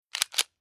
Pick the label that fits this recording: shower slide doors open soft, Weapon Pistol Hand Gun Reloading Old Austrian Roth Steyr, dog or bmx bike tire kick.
Weapon Pistol Hand Gun Reloading Old Austrian Roth Steyr